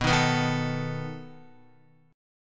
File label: B 7th B down Guitar; B7 strummed; JGuitar